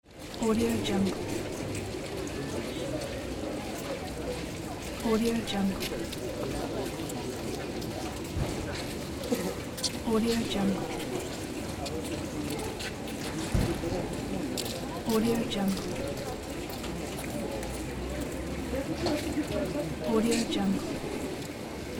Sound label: city street in winter